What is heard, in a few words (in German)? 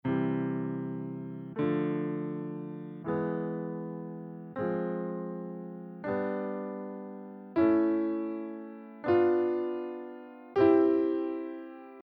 Stufen in C Dur falsch